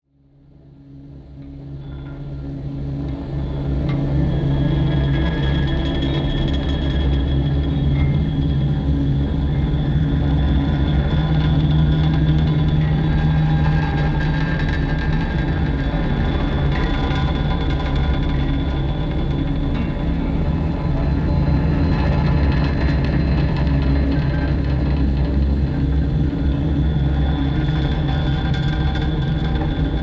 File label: menu music; Milk outside a bag of milk... OST (2021)